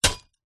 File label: impacts metal hit small stones